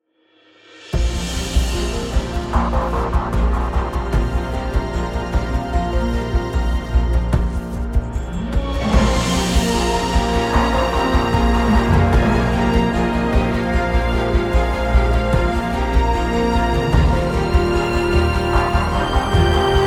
Grand Historical Landscapes

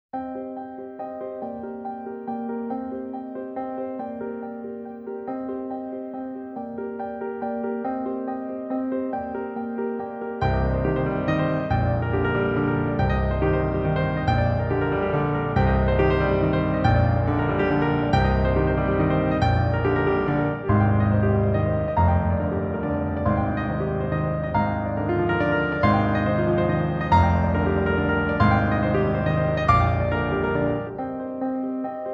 solo piano

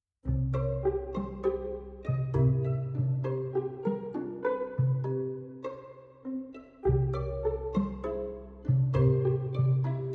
pizzicato

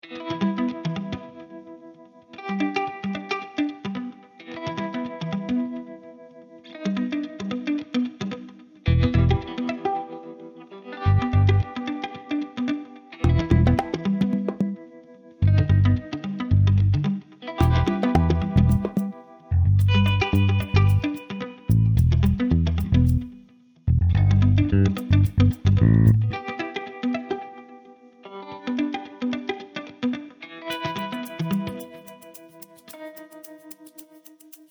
Irritating

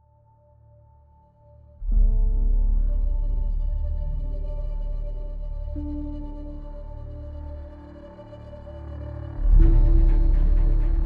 flute melody

